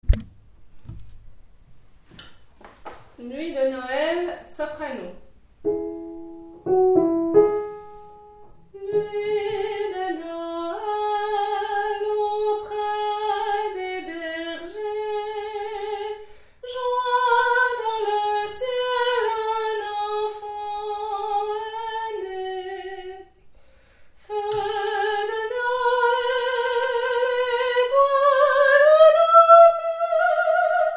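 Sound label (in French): nuit de Noël sop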